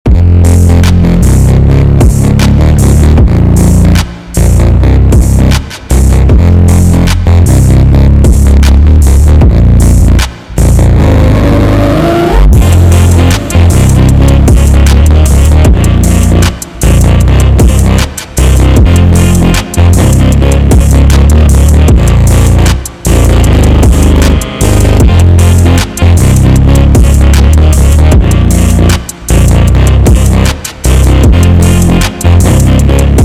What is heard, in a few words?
Bass boost